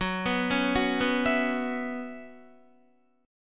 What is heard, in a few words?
Gb 7th Suspended 4th Gb down Guitar, chord